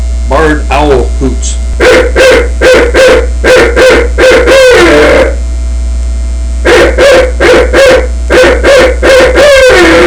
barr owl hoots; booster; Southland Sounds

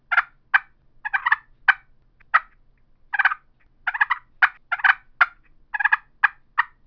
push pin box call clucks and purrs, turkey; dixie

